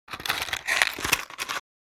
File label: Matches box handling open pick up a match